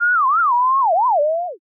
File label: rewind time